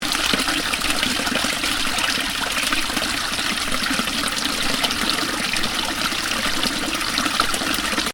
Water pipe loop, Water: running from pipe into stream, loop; Stuart Duffield